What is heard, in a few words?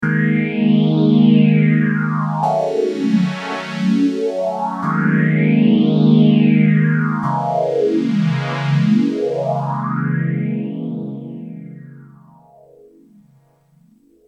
m1000 boss ph3